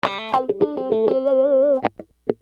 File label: Wah Guitar; Construction Kit; HIP-HOP; Sonic Foundry (Sony Creative Software)